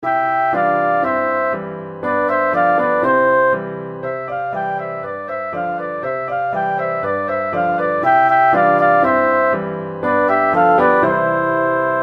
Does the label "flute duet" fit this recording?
Yes